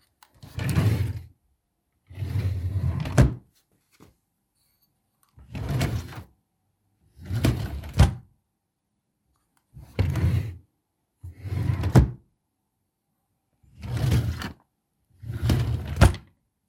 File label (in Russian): derevjannye jashhiki v divane